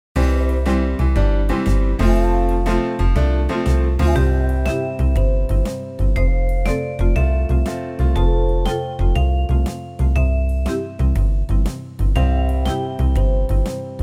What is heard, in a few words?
country shuffle